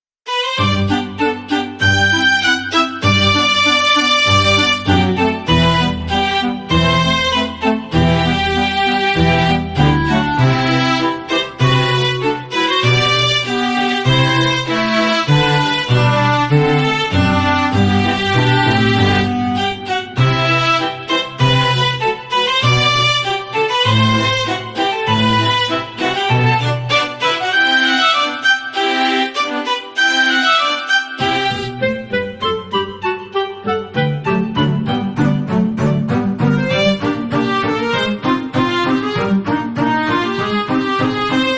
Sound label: Bgm Fantasy